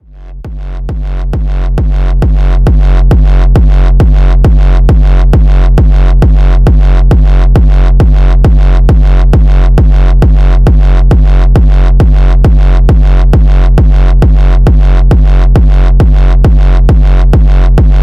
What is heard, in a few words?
signature kicks; zumstein